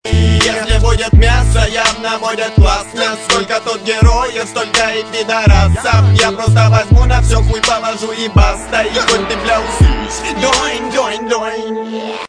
Hip Hop Dance

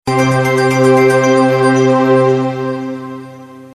BASS Sms; Подойдет для смс. Музон для 'суровых' сообщений.; Музон для 'суровых' сообщений. От начальника или свекрухи, например.

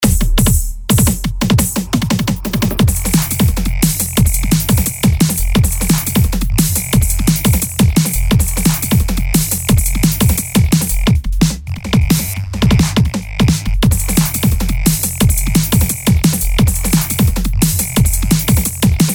bass

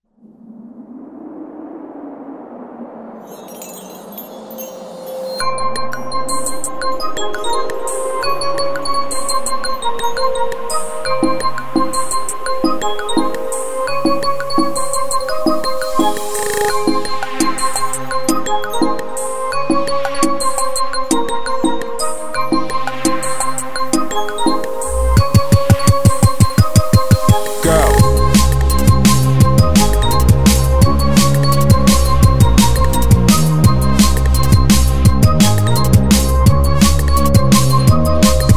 Jingle Bells D n B REMIX; Весёлый drum'n'bass ремикс, поднимающий настрой!

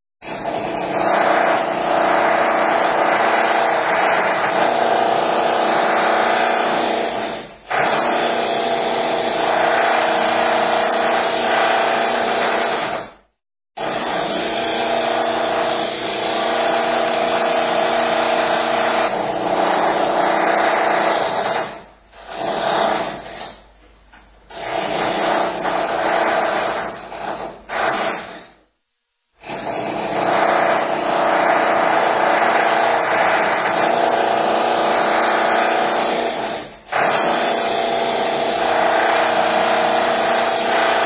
Drill; Дрель!

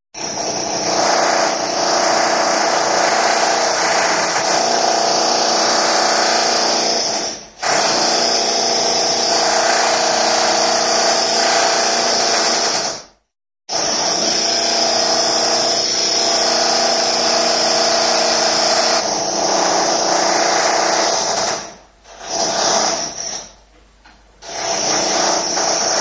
Drill; Дрель!

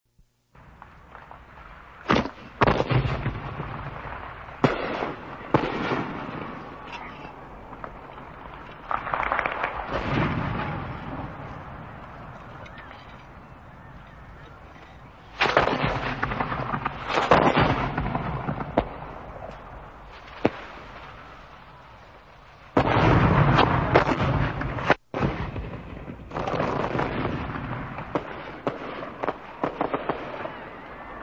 Boom

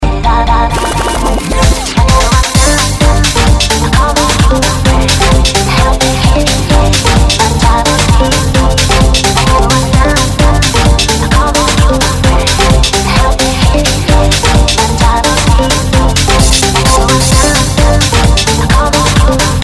remix

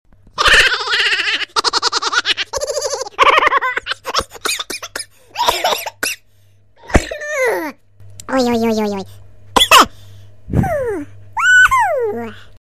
Haha

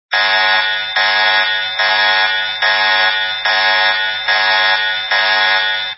Klaxon

